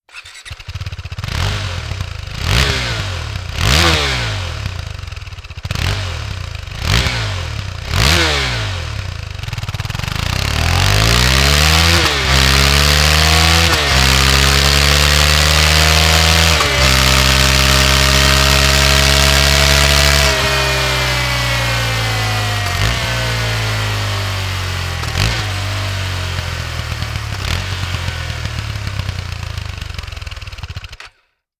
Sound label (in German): 125 Duke Sound Serienschalldaempfer; EURO 5; 125 390 Duke